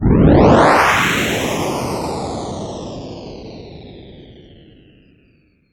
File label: FX Sweep Down; vengeance essential club sounds-3